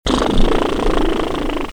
Bruit 2 bouche

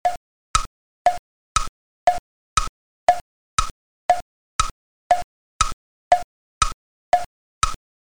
Tic tac; bruitage; Electro-menager

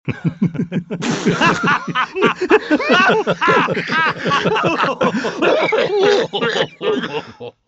Rire après blague

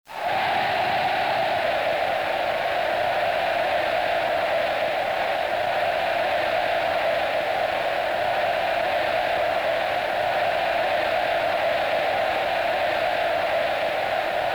Ambiance stade foot; bruitage

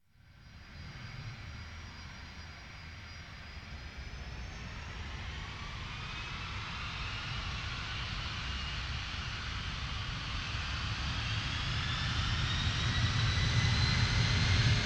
Décollage avion

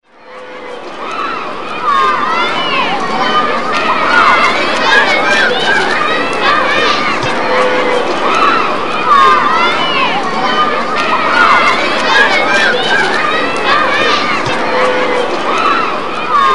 Ambiance jardin d'enfants; bruitage

